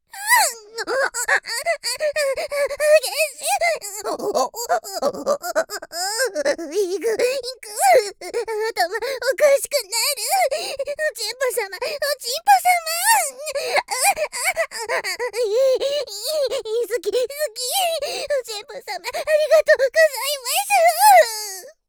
あぁん♥あっ♥あっ♥あっ♥

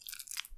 浅めにストローク, ピストン運動 激しめ

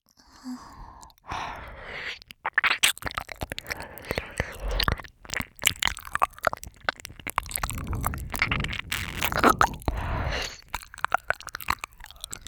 左耳舐め

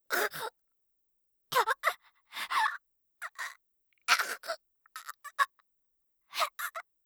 猛毒; 効果音なし

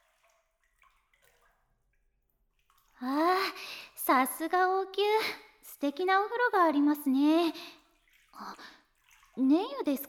寝湯 で 耳掃除（右耳）

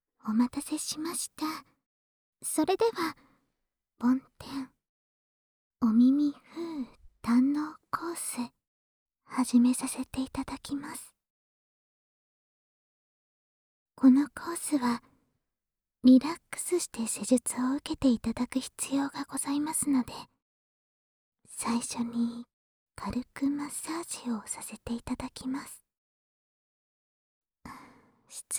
梵天・お耳ふー堪能コース; 音喫茶ねころび、体験チケットコース【癒しの1時間】

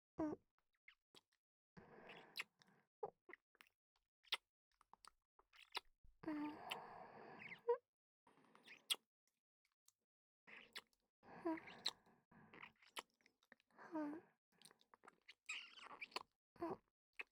キス; アルメリアのキス

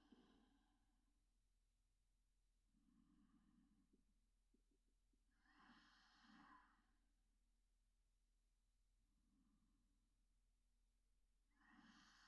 添い寝

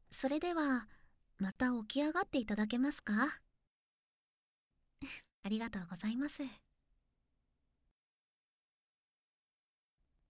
耳ふーふー, ASMR

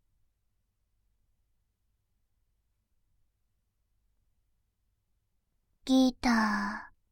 ギター; バイノーラル左

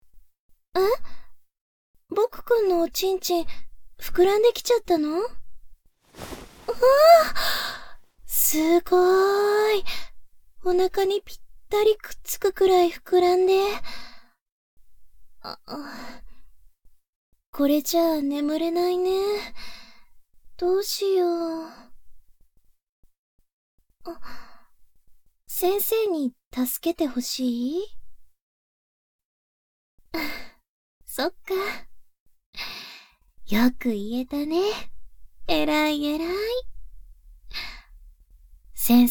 ガーゼでごしごし

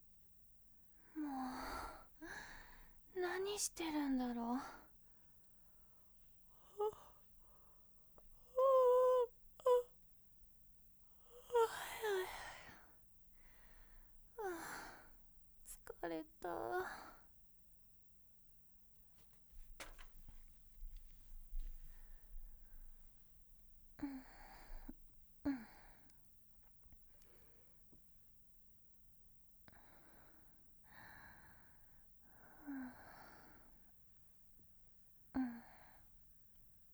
寝息

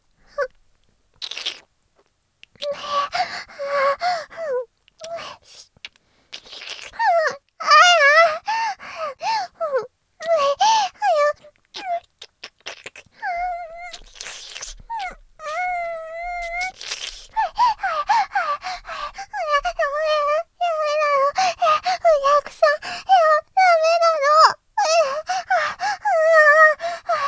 喘ぎスパイス！

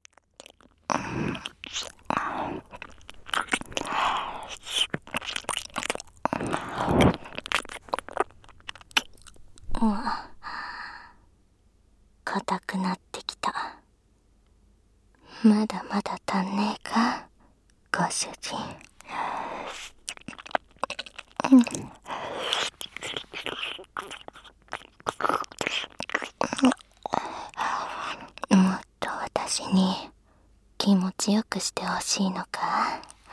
耳舐め 射精介助 ノイズ除去なし; メイドさん

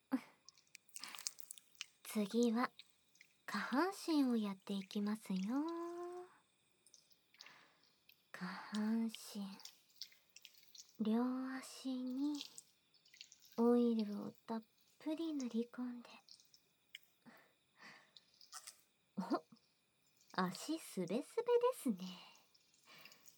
下半身のオイルマッサージ, ASMR

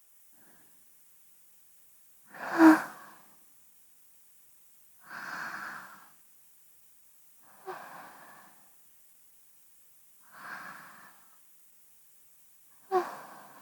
寝息; ミミカノ vol4 寧々; バイノーラル美少女耳かき添い寝シリーズ; スタジオ収録

